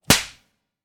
smack

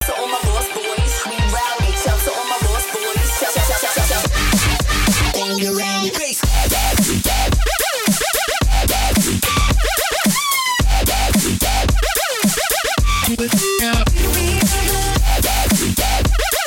FAST TEMPO

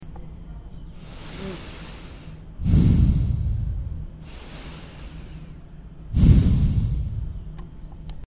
Breathe

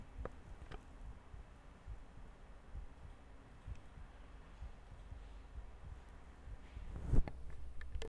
HEARTBEAT

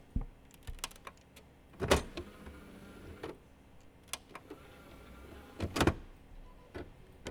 OPENING OF BLUE RAY CD SLOT

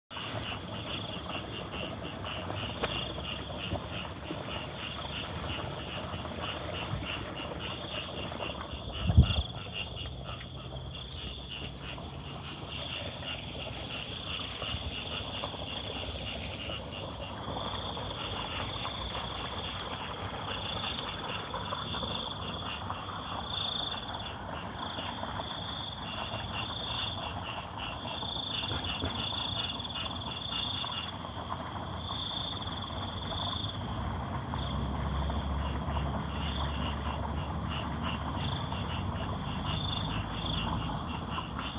Frogs

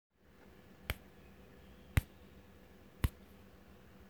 Knock

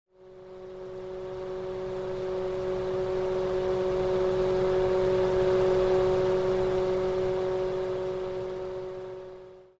White Noise

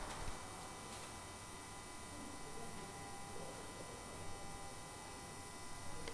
STRONG STATIC BUZZING

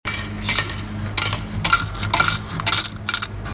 Coin

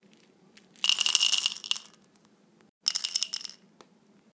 Cereal

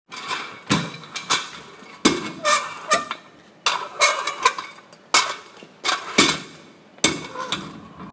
Bad sound

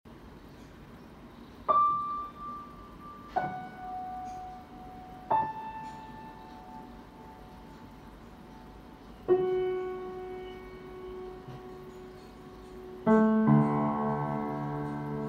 main melody

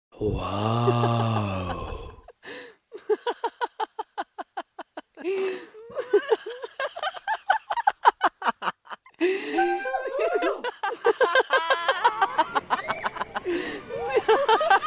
LAUGH SOUNDTRACK

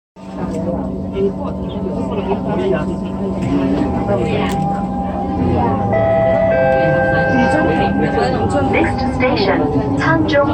Mrt Train

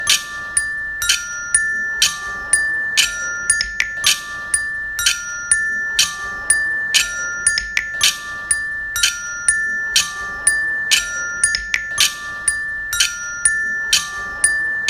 silent high creepy

